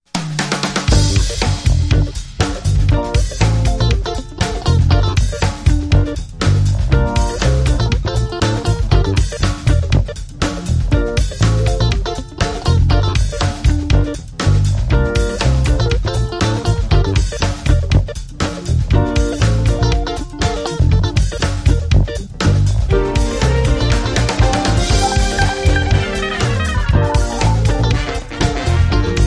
Drop to the Beat

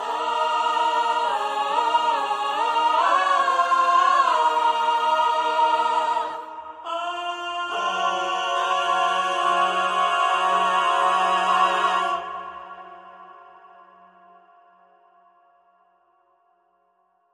Choir Finale

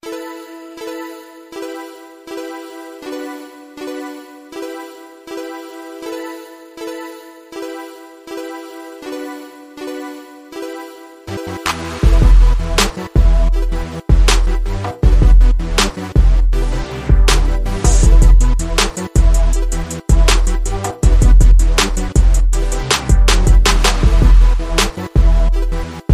Expensive Beat

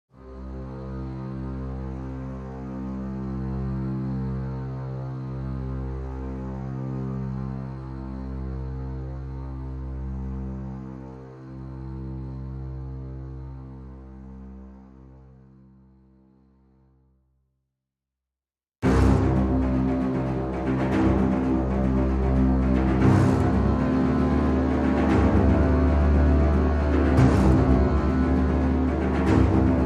Strings

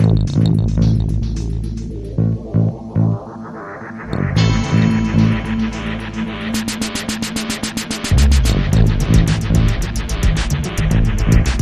Drifting Bass

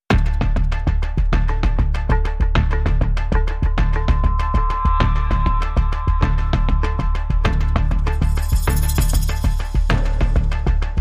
No Piano No Heart Monitor Beep

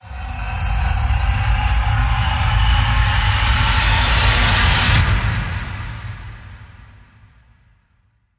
Reactor Power Rise

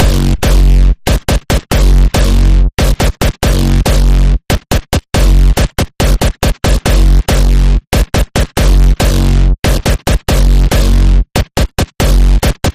Pulse Of The Machine, F sharp

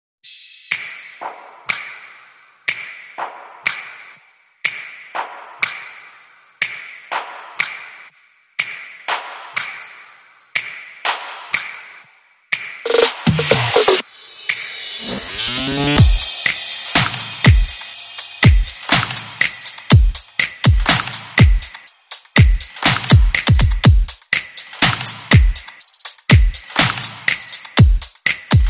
Drum

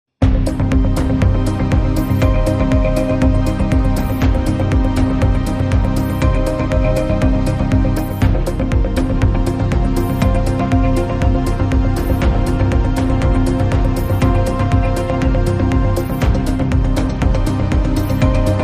No Guitars

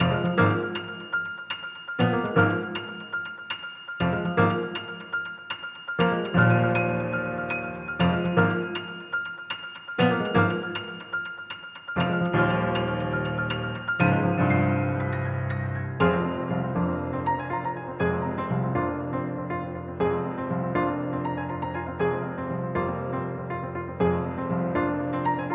Jazz

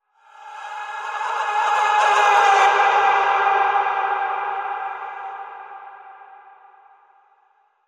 Predator Broken Voice Bend

